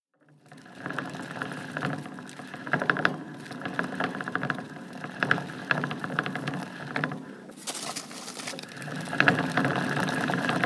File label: Hamster